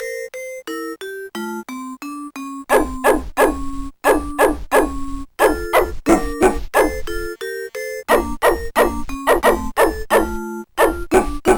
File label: bell dog; Deluxe Music Construction Set